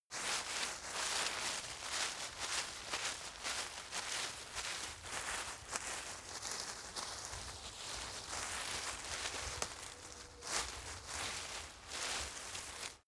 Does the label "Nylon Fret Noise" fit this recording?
No